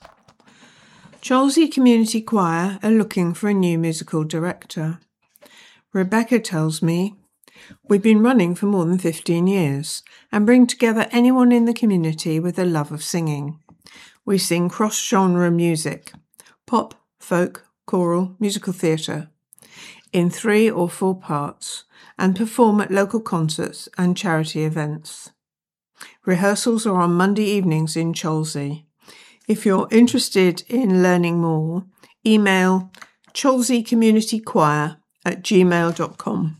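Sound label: Community choir; R & A Cholsey Conmmunity choir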